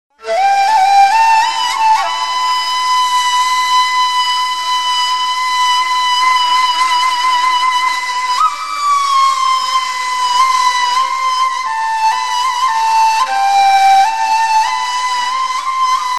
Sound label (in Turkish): Ney Sesi